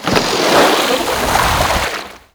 Aqua Board Mount Dismount; Warframe; Yareli

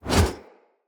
Split Sword Whoosh Heavy; Weapons; Player; Swords; Starter

